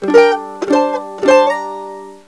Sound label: mandolin